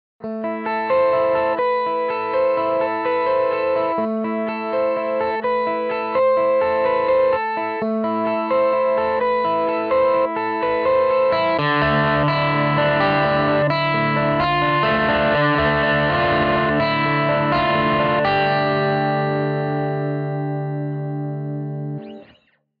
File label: Kontakt7 Rock Guitar